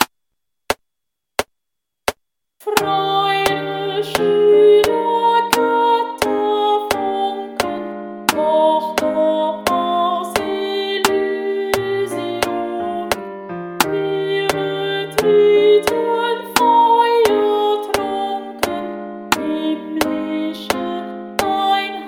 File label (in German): Ode an die Freude klassisch Sopran; DER GRÖSSTE CHOR IM WESTEN